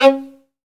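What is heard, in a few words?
SOLO VIOLIN, Synclavier Strings; ILIO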